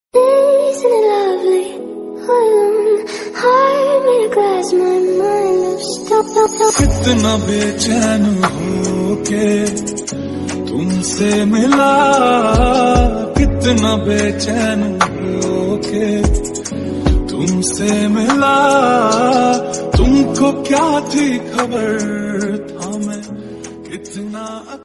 lofi remix song

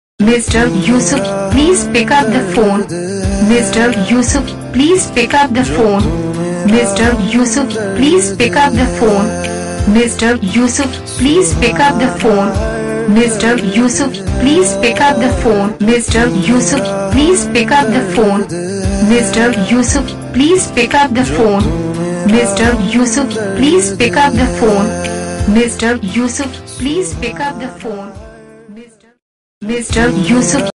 please pickup the phone, name song

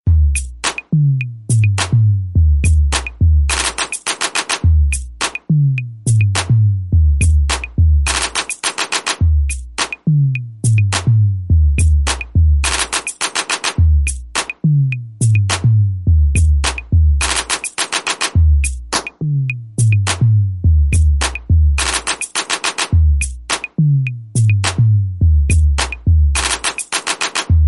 instrumental beats

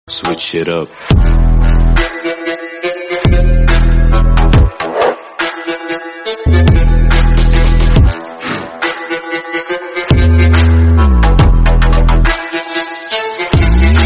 slowed bass boosted

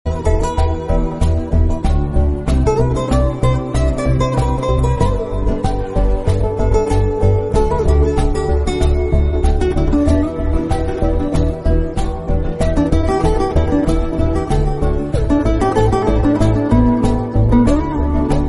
bgm telugu; nenu sailaja

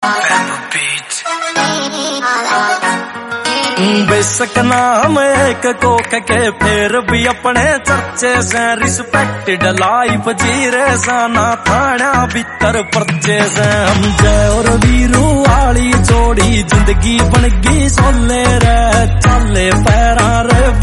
song, haryanvi

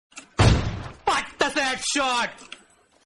headshot awm gun sound dialogue; bgmi

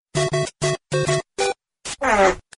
fart; super mario

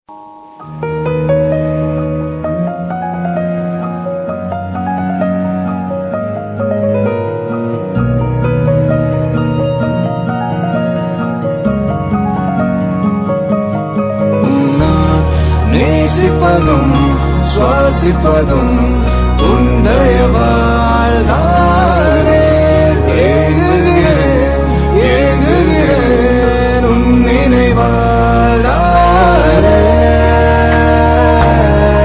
tamil sad bgm sad bgm

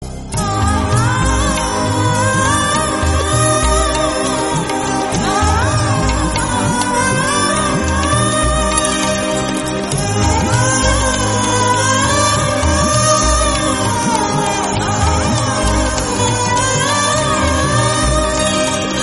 movie bgm; bombay

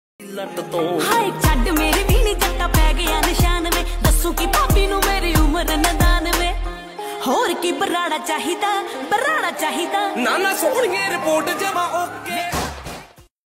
Ringtone

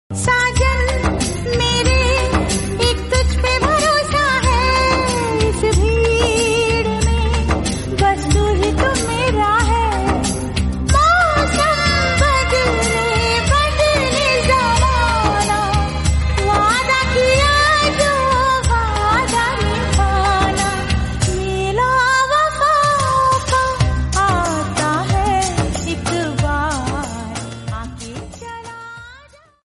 hindi; mela 2000

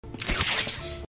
web shooter sound

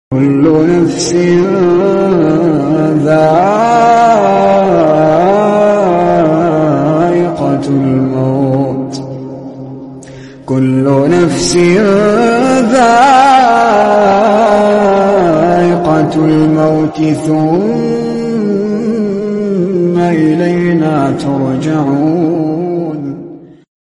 tamil song

